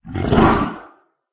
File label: dragon warrior attack voice middle